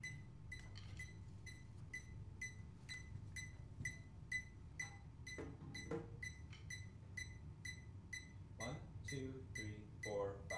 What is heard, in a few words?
sd cycle medium slow